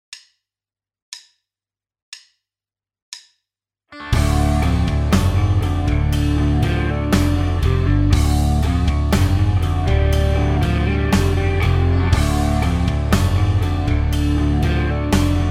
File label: rhythm